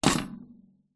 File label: impacts metal pole hit vibrate; zapsplat